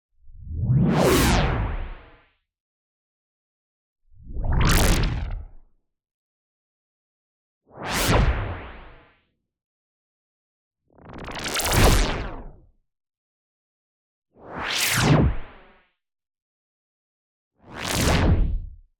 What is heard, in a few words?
whoosh, gritty pew delay; boom library; Anime Essentials